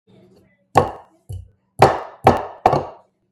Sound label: Food crusher